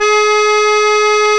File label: ACCORG; Roland - LA Composer vol. 1